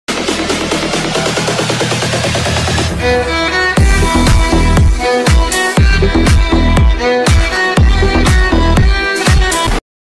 high bass instrumental ringtone, boosted remix

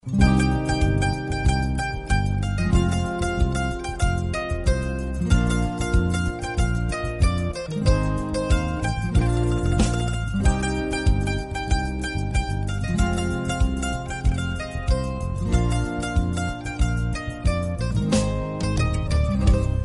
piano Instrumental ringtone; Orange; Telugu

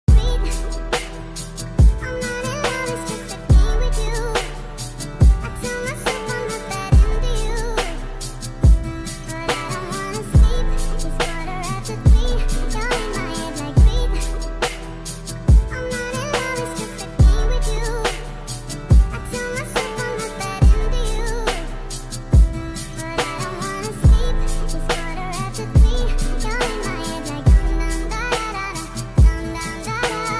slowed reverb ringtone

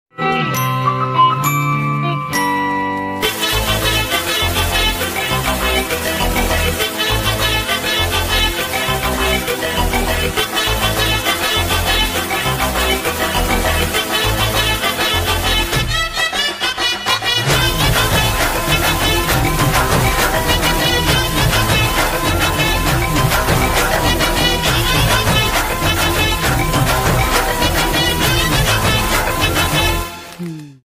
love bgm; kathi

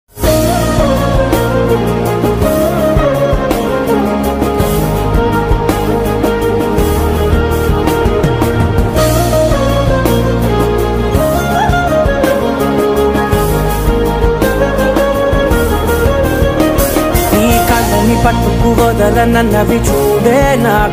instrumental ringtone

